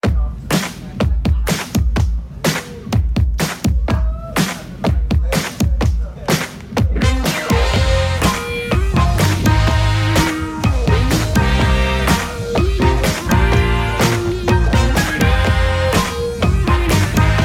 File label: sting splash; R.B.I. Baseball 18